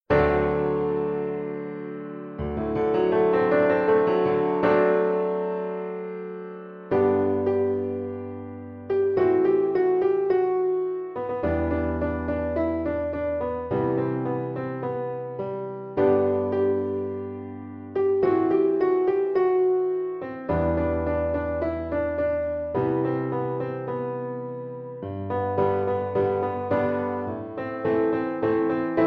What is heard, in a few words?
Easy Piano